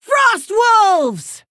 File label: Female Orc Attack